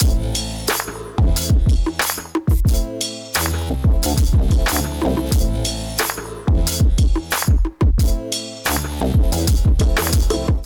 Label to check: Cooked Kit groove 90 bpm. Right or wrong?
Right